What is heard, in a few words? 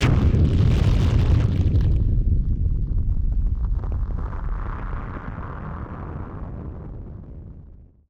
Firework FX; musicradar; bomb factory